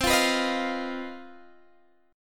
C Minor Major 7th Double Flat 5th chord, Guitar DADGAD